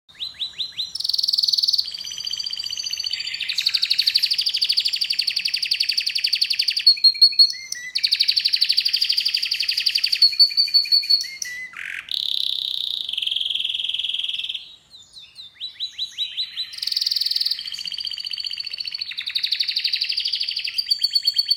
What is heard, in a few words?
Canary, Bird Songs